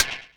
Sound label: Hihat Closed Dub; One Shots; Drums; Future